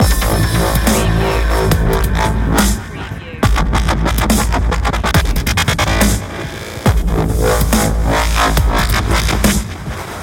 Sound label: dark heavy loop, Huge, snarling and slamming Dubstep style bass-line; electronica